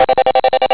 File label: cartoon phone; cartoons